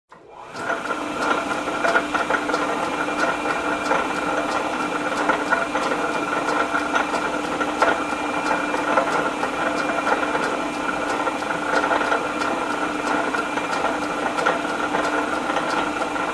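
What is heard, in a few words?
sounds of lathe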